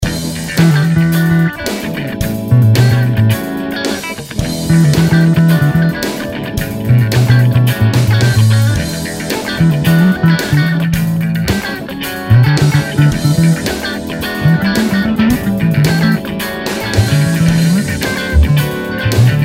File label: Groover In E 110 Solo Bass; Blues Solos